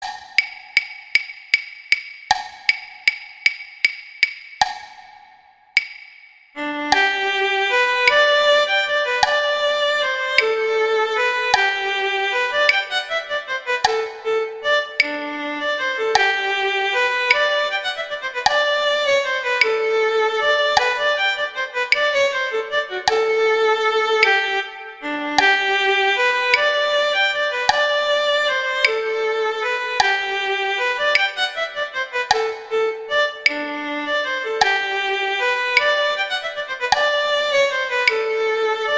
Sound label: Larghetto Guitar; Paganini; Sonatas Op1 Vl Gu; Sonata No 2